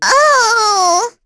Vox Damage; Lavril; kingsraid; voices heroes en

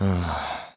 Vox Sigh; Riheet; kingsraid

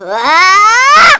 Vox Casting; Sonia; kings raid; en

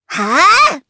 Attack, jp; Hanus; kingsraid

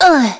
Vox Damage; Yanne; kings raid; en

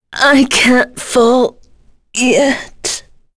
Vox Dead; Tanya; kingsraid

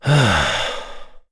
Sigh, kr; Kain; Kings Raid

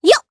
Vox Jump, jp; Dosarta; kingsraid

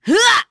Vox Casting; Nicky; kingsraid; voices heroes en

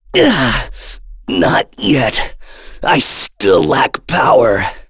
Vox Dead; KaselB; kingsraid

